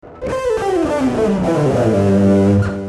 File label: legato